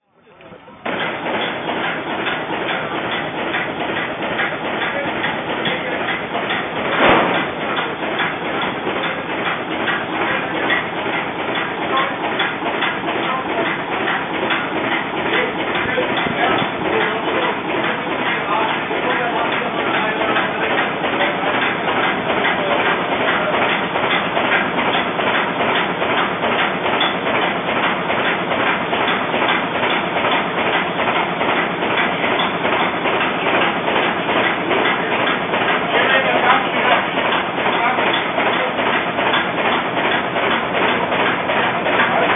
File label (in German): Hafenfest Dampfmaschine